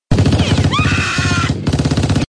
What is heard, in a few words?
Shooting